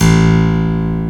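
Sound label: buff stop A1, harpsichord; AKAI S-Series CD-ROM Sound Library VOL-2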